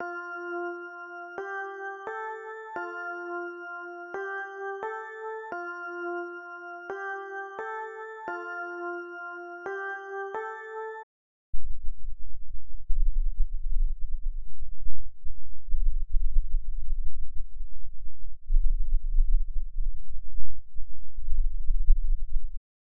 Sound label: fx pitch time